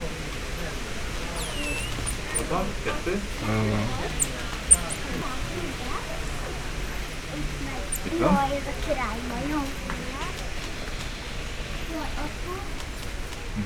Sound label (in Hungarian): lisztmajmocska szeles idő; szegedi zoo 2011